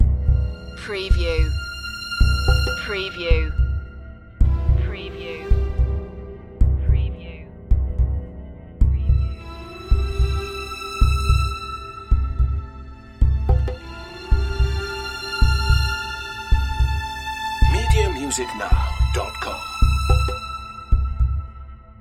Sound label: heart beat nerve loop